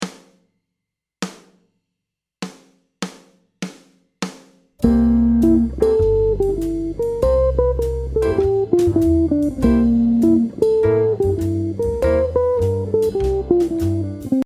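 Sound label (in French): arpèges, guitare jazz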